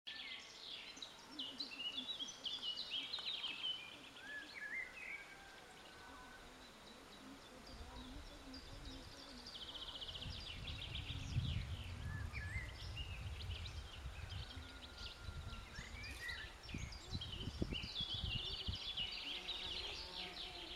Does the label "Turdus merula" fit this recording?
Yes